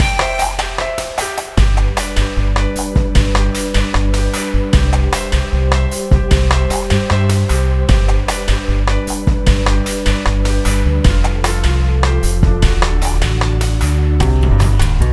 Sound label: backing